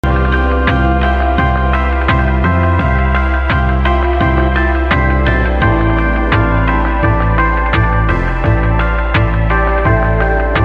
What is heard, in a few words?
chill lofi electronic loop